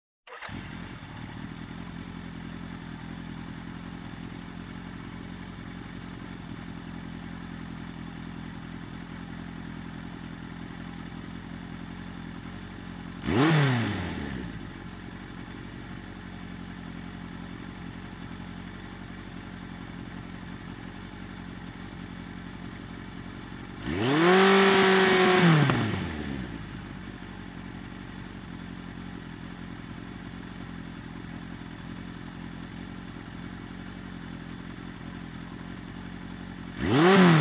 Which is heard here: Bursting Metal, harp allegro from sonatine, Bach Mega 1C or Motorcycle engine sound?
Motorcycle engine sound